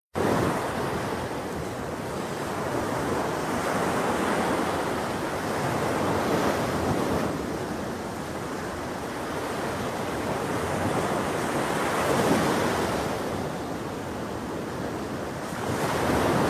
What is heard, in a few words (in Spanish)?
ruido de mar